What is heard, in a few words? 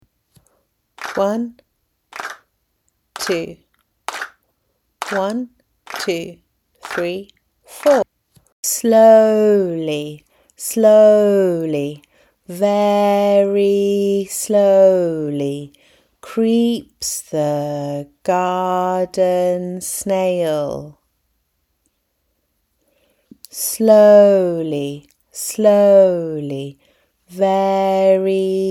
Snail and mouse no pulse; key stage 1; year 1